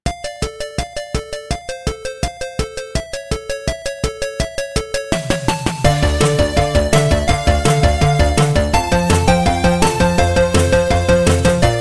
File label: 8 Bit; Gravity Falls